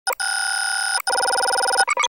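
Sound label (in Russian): различные звуки оповещений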